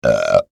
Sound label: Human Male Burp; Burping